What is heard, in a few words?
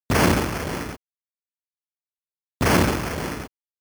Explosion of Enemy Aircraft - Ground